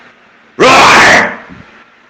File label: roar arab